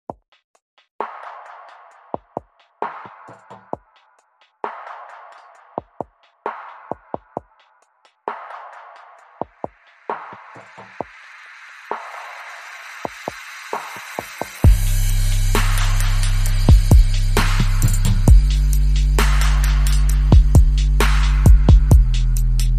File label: Chill Break, Drums Bass and SFX Only